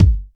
raw kick single shot g sharp key; kick drum samples

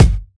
natural steel kick drum sample f sharp key

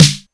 nice steel snare drum e key

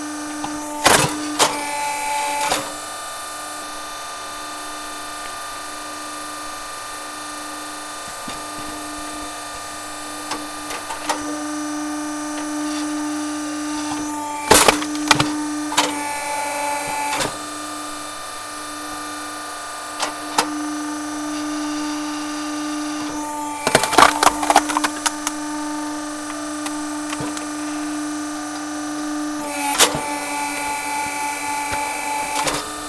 wood log splitter

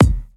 crisp bass drum sample f key; kick drum samples

